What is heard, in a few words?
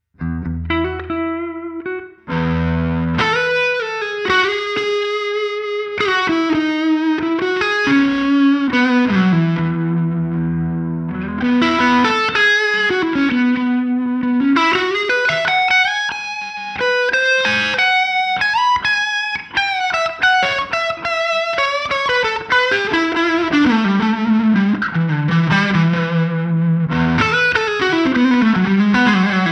guitar; Cicada → Verb → Amp